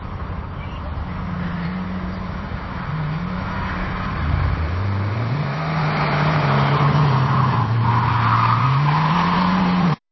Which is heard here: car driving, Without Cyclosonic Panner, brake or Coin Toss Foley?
car driving